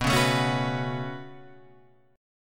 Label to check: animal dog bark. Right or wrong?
Wrong